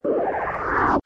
accent

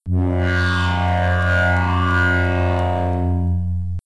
Special Buzz; SSV-Music

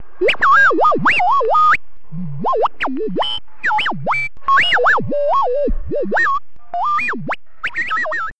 Tuning In; SSV-Music

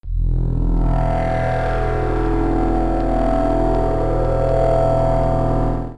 Low Hum; SSV-Music

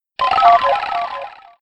launch low pitch; SSV-Music

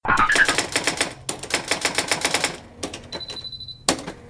zoom

